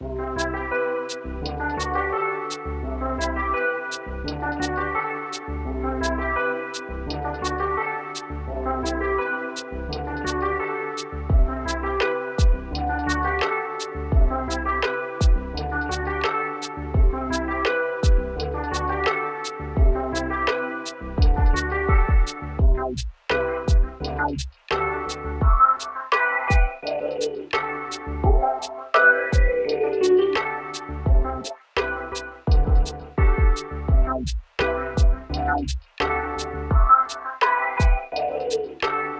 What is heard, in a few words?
Lofi ChillHop Beats Chill Hip-Hop Beats